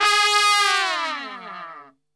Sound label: G#2, Brass; Best Service; Brass Super Section; TRP LNGFLL